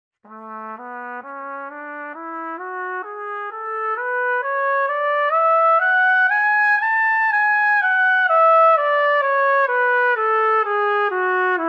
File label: Trumpet